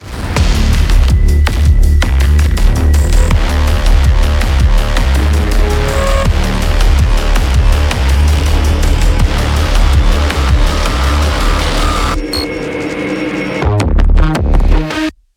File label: Flesh & Metal light; Doom